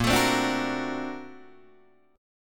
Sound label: Bb Augmented Major 9th Bb down Guitar Open D